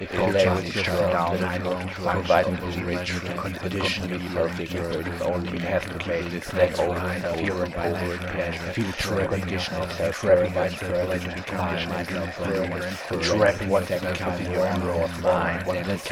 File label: Drone loop, hypnosis; drone training